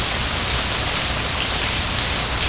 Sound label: Waterfall